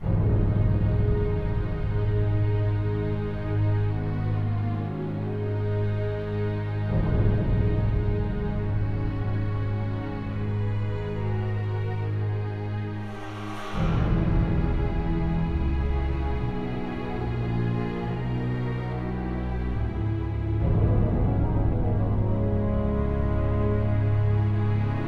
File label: Arena Rome Interior Calm